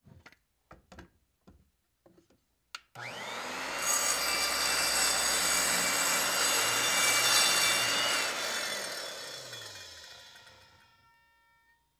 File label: Elect Circular Saw On